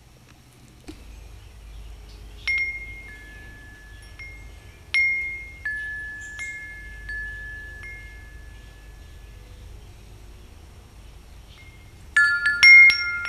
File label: Wind Chime